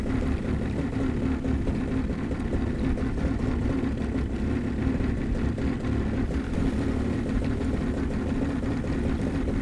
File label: vehicle speedboat idle; teardown ps5 windows xbox series xs gamerip 2022